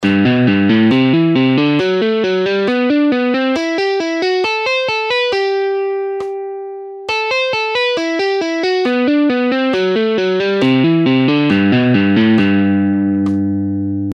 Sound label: Exercise Half Speed, guitar